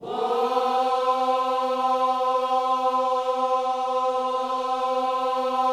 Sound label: WHOA C 4, Vocal Planet Gospel; Spectrasonics; Vocal Planet Vol.1 Gospel; akai